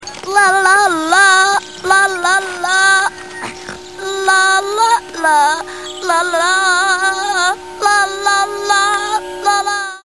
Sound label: jingle bells